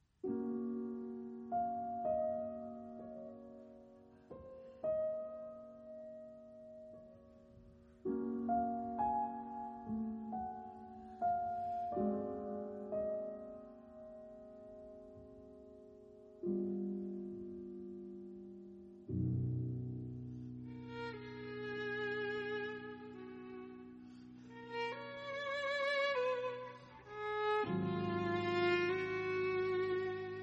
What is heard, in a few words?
Sonate violon piano